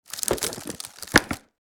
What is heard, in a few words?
Wicker basket lid lift and open